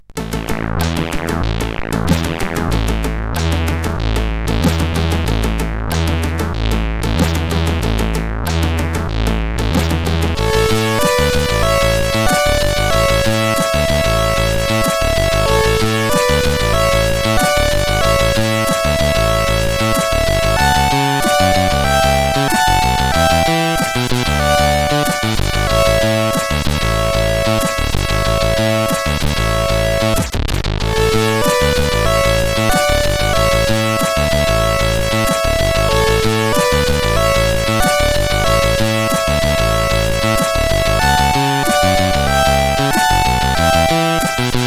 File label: A-dur sid CSG8580R5